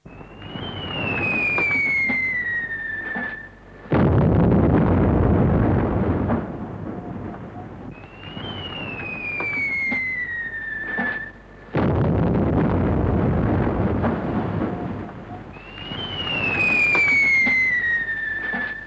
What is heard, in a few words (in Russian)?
svist i vzryvy bomb